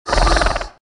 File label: Velociraptor - Growl; RadwinIsland